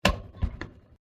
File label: Icebox 1940s Door Close; Various old or antique devices; Appliances; Household